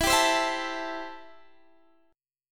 A-Minor 7th E

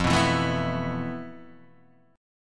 F Major 7th Flat 5th, guitar chord

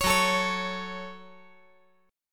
Gb Minor Gb

